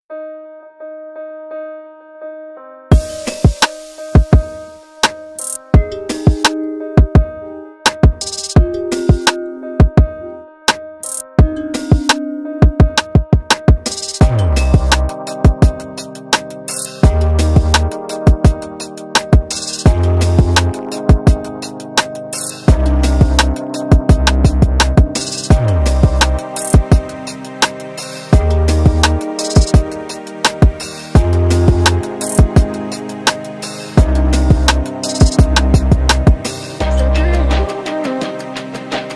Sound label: hip hop inspirational